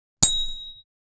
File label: the metallic sound of a